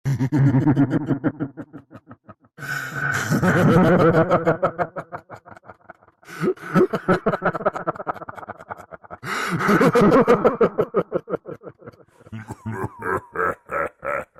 creepy evil clown laughing really scary horror sound effect